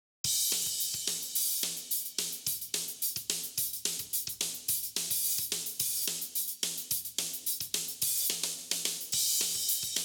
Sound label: drums